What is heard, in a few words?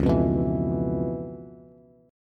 Db 7th Sharp 9th Db down Bass; Db7#9 strummed; JGuitar